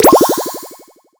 UI MagicItem Ready

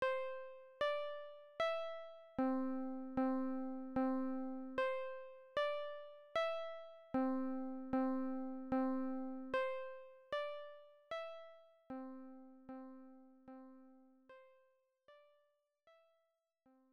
FM Test

